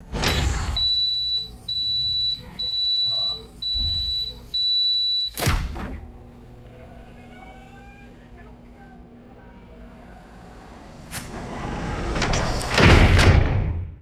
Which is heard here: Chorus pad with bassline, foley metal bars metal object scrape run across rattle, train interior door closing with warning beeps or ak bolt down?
train interior door closing with warning beeps